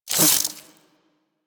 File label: Infested Ashen Spike Proj Whiz; Warframe